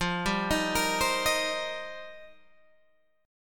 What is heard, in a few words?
F 7th Suspended 2nd Suspended 4th, Guitar Open G